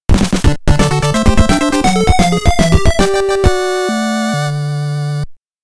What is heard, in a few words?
Game Over BGM; konami famicom chronicle vol.3 rom cassette edition